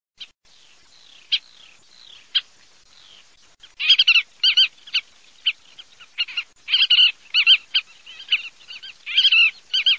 pernice di mare

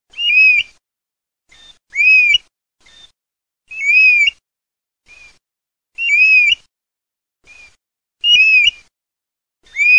PIVIERE

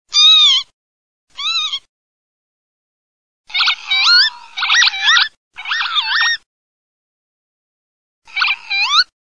PAVONCELLA, birdsound

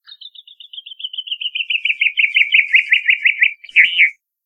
fringuello, birdsound

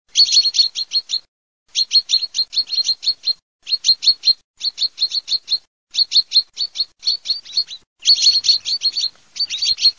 FRINGUELLI CAPANNO, birdsound

